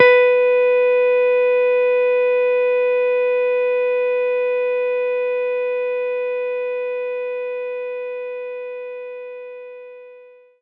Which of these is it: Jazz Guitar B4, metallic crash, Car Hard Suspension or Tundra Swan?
Jazz Guitar B4